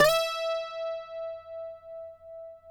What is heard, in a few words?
CUATRO; Spectrasonics; Hans Zimmer Guitars VOL-2